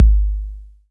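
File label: bass drum 808 Tape Decay D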